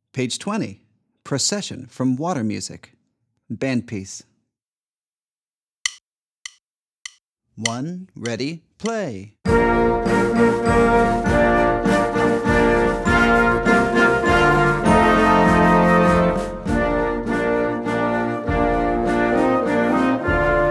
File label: Procession; Water Music